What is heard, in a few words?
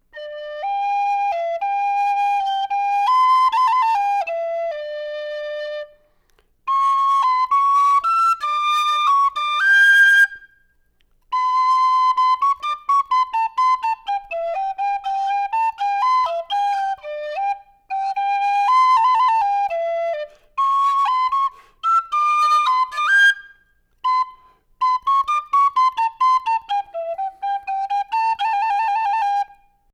Tin Flute, AKG C414; C414 COMB